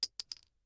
pistol generic, bullet shell; Bullet Shell Sounds

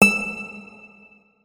notes, guitar; Hideout Furniture

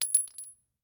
pistol generic, bullet shell; Bullet Shell Sounds

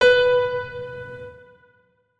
notes, keyboard piano; Hideout Furniture

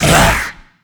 burer tele, monsters; Soundscape Overhaul

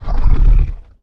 growl, Angry Chimera Growls; TBs Angry Chimera Growls

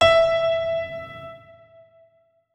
notes, keyboard piano; Hideout Furniture

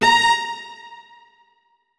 notes, keyboard; Hideout Furniture

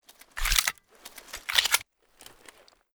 px4 reload, weapons; 92fs; Pretty Reanimated Pistols

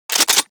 toz194 pump, weapons; Blindsides Reanimation Pack

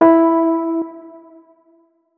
notes, keyboard, electric piano

